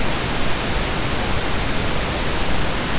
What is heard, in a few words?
pink noise; reference tones